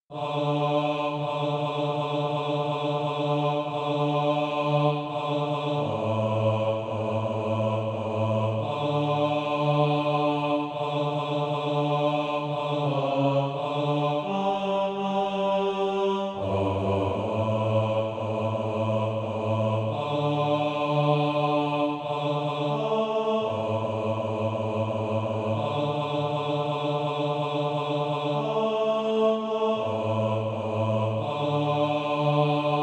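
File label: bass, Practise Music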